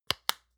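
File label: lotion large lid close medium double, hairdresser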